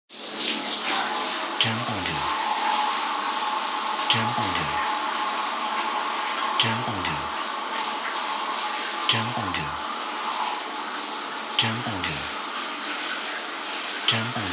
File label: Shower